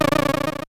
Bee Fade; Bee buzz fading away; Zelda

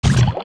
Fall Down Deep Water; Link; Zelda; OOT

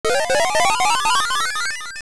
Magic Stump; Standing near a magic stump (also used in Four Swords for entering an area)

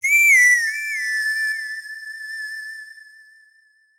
Horse Call Half; Whistle with the Horse Call (full and half versions)

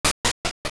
Barrel Exit; Exiting the giant barrel

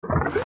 Door Membrane Open; zelda; OOT

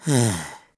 Sigh; Rupin sighing; Skyward Sword; zelda

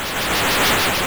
Tornado; OOS; Onox; Zelda; Oracle

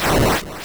Segment Explode Full; Moldorm

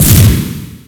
Dive Land; Ganondorf landing with the Diving Wizard's Foot

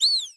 Jump; Bunny Hood

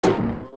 Sign Bounce; zelda; OOT

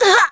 Hurt; Link getting hurt

